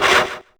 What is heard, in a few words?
saw